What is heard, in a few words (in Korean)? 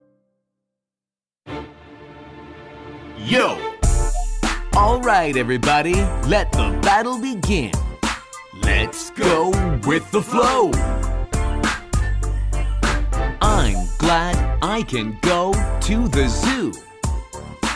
영어남 챈트 Rap 스타일; 남자